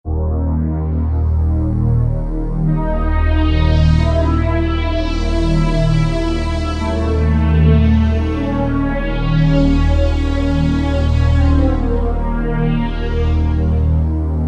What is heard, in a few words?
Wave2001, Yamaha DJX; Voices